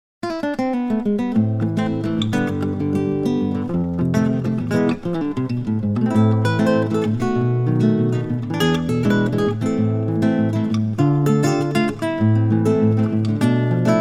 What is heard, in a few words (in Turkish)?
santral fon muzikleri